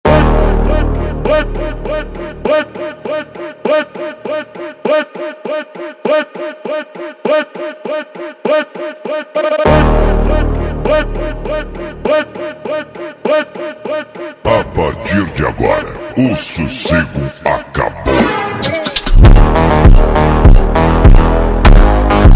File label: slowed, funk